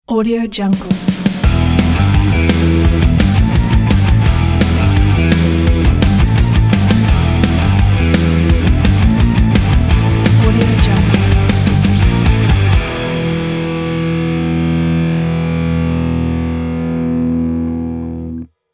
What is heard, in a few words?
rock intro